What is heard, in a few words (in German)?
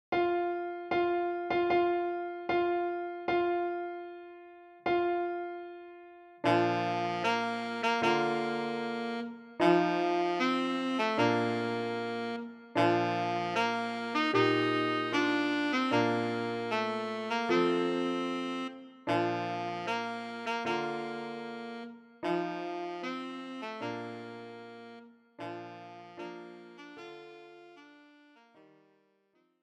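Hochzeitsmarsch Altsaxophon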